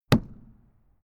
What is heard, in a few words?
vehicle door slap